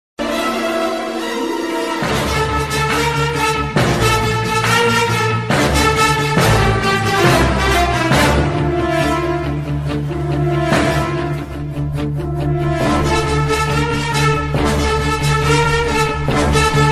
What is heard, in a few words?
mass bgm ringtone; ayan